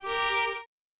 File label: Violin; backpack hero gamerip 2022